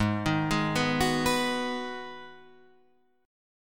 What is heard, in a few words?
G sharp Minor 7th, Guitar Half Step Down